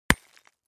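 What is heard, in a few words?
Brick Hit Sledge Hammer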